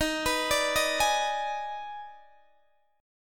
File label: Eb Major 7th Suspended 4th Sharp 5th, Guitar DADGAD; down